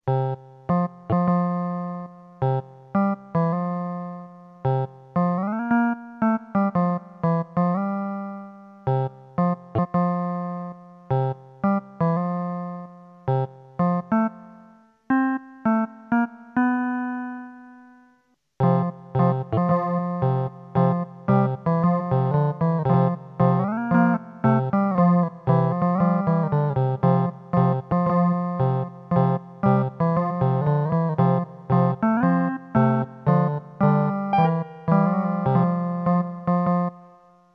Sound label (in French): Marche Nuptiale